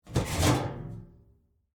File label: Trash Can Lid Open, Household, Trash, Can, Lid, Open, Metal, Garbage, Can; BlastWave